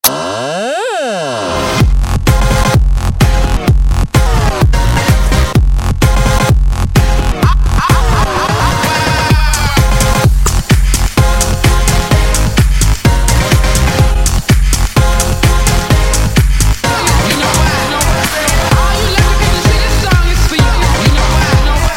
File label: electro ringtone